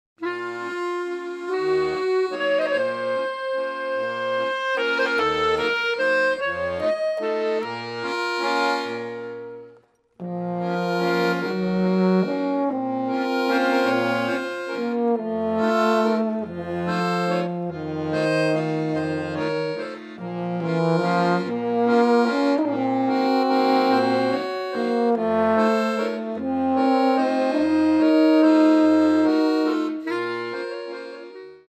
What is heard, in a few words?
Waltz